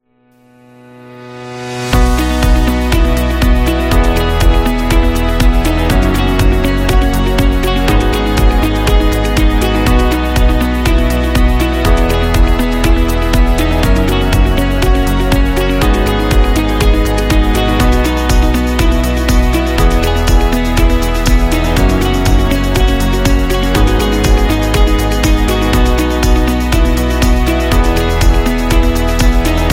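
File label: Soft Presentation, corporate music; corporate success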